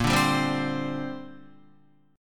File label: Bb 6th Add 9th Bb Guitar; Bb6add9 strummed